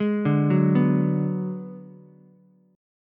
Ab Minor 7th Flat 5th Ab, down, Ukulele; arpeggiated; JGuitar

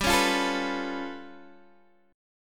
G 9th Flat 5th, Guitar DADGAD

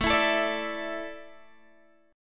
A Suspended 4th down Guitar Drop D

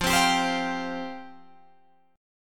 F Suspended 2nd F Guitar Drop D

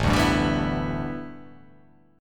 A 7th Suspended 2nd Suspended 4th

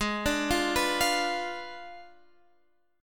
G sharp Diminished 7th, Guitar, Standard; G#dim7 arpeggiated; JGuitar

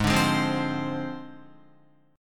Ab 6th Flat 5th, Guitar, Ab6b5 chord; Ab6b5 strummed; JGuitar

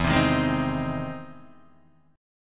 E Augmented Major 7th E down Guitar DADGAD

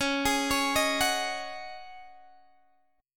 Db Suspended 2nd Suspended 4th Db Guitar Open D

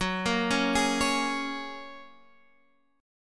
Gb Major Add 9th, down, Guitar, Standard; arpeggiated; JGuitar

